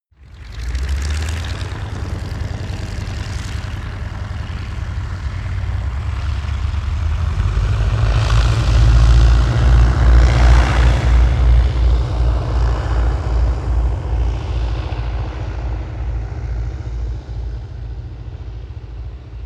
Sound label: propeller plane ju52 roll and take off short